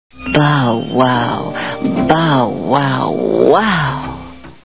bowwow

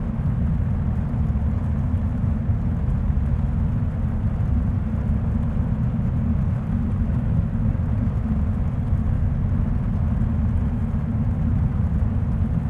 ambience disaster rumble

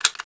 spas12 insert

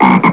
toad

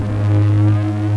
electrical hum

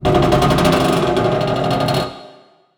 metal creak

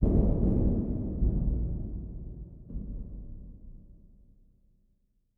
metal hit far

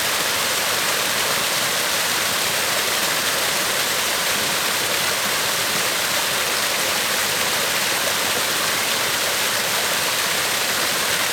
ambience water

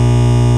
Hum Light

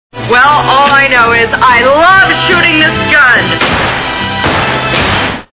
shooting gun

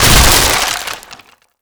wood break big

